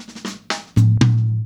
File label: Ballad Fill, Drum, 70's Ballad; Sonic Foundry (Sony Creative Software) - Loops & Samples Drum Tools; Laid Back